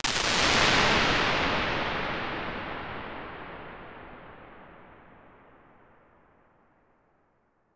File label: matrix reverb